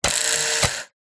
household razor electric male motor whirr close up open short burst; remington